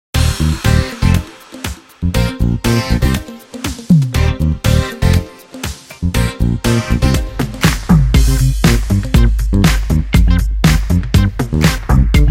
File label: Nokia Tones; Crush; Windows Phone apps